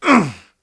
pain; police

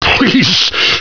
hurt

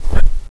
dynamite throw, weapons

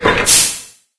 mclick

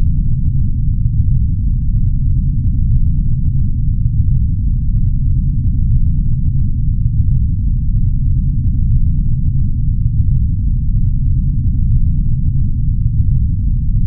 spaceship ambience

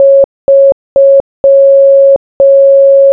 morse; ragemap2024

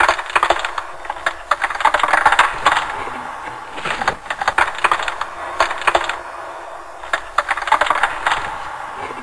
ice breaking

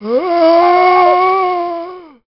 zombie attack, male

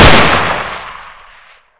pl gun

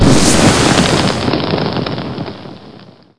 fireball

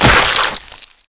meat toungue

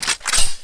gun cock

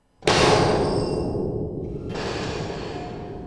metallic drop

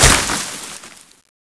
vine

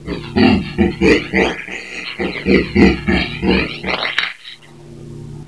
alert; flygare

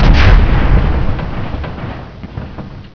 crash, meteor

